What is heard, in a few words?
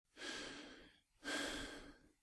Breathe - Half breathless; Eden; RadwinIsland